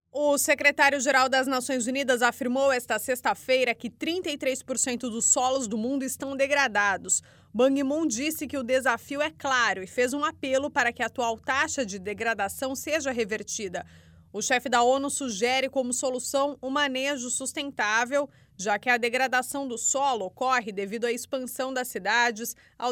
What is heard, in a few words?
solo